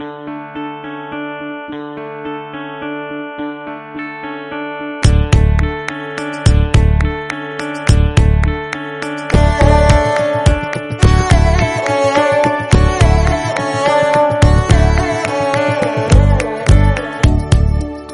violin bass

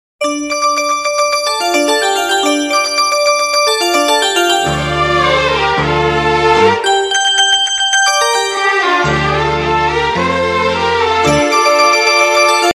happy bell

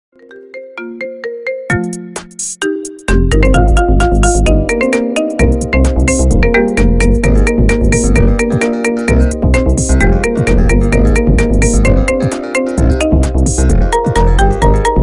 marimba ringtone remix

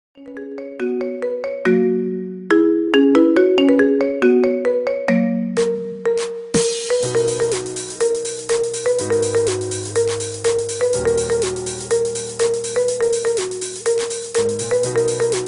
marimba ringtone remix

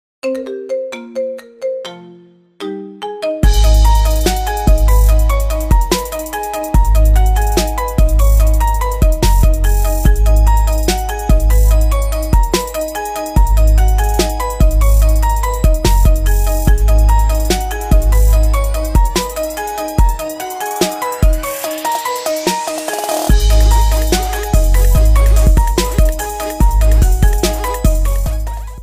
marimba remix; exocist

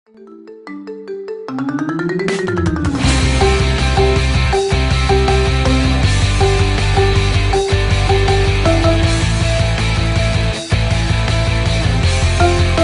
marimba ringtone remix

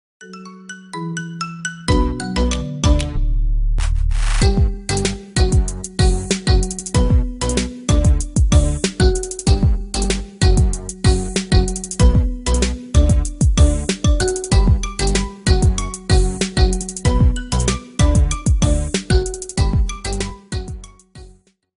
marimba ringtone remix

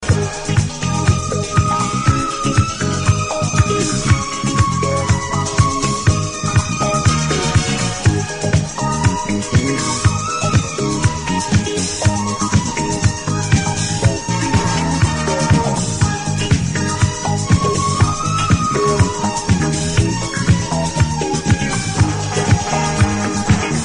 the 80s melody

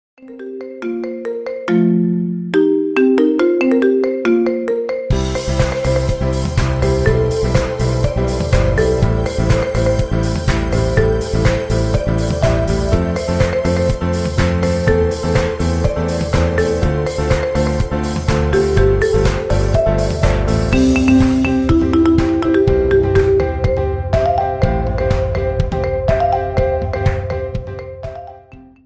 marimba ringtone remix

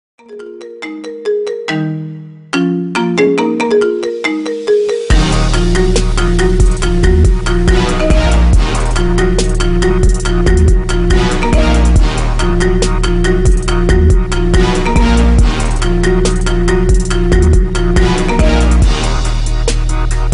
marimba ringtone remix

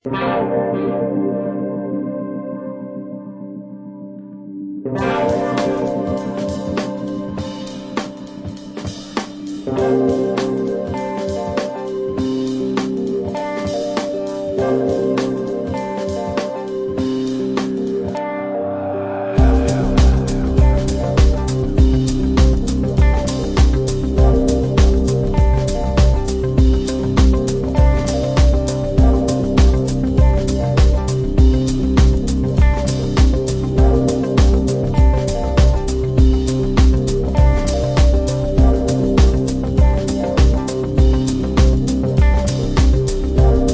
romantic

